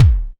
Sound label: short kick single shot b key